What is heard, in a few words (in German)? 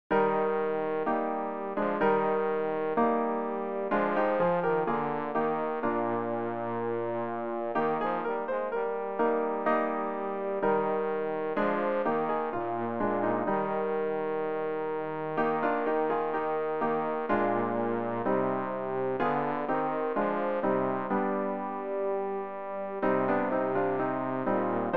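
bass, Übehilfen für das Erlernen von Liedern; tochter zion freue dich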